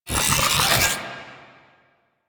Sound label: Lightning Stomp Cast; Warframe; The New War; Archon; Boreal; Abilities